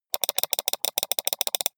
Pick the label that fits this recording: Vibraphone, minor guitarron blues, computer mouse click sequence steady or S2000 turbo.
computer mouse click sequence steady